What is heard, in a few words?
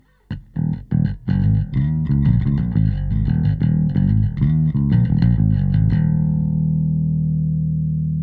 bass; ADC1 Compressor